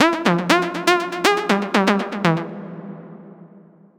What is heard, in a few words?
Trance Synth